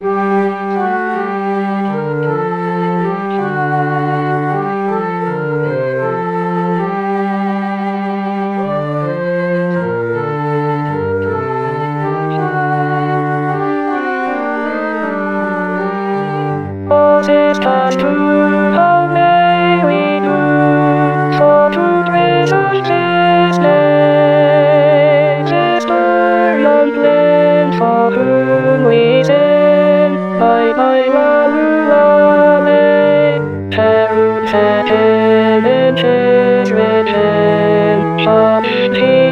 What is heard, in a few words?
alto; coventry